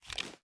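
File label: pistol reload empty start; gmod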